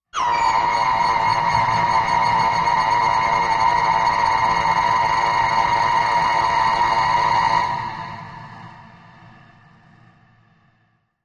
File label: Discomfort Inducer Ma, machines winches